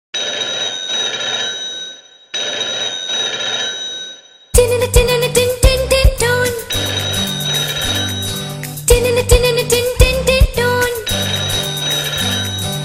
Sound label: phone